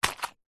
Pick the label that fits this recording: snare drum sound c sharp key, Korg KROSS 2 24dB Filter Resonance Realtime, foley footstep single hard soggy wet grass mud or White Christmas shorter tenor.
foley footstep single hard soggy wet grass mud